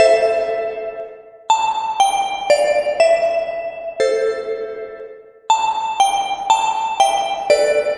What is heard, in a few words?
120 synth bells melody; SYNTH AND ELECTRONIC LOOPS